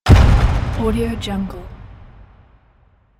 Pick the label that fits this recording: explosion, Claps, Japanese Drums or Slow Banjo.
explosion